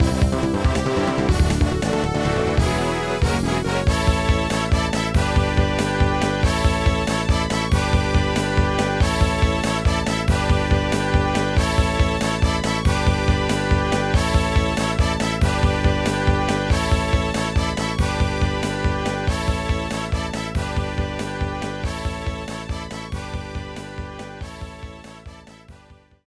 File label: Fanfare; Mario Golf Toadstool Tour; Birde (Fanfare)